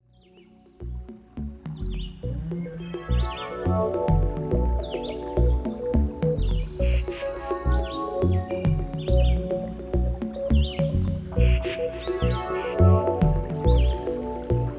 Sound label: alphawave mono